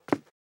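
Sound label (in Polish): kroki pojedynczy; apocalipsis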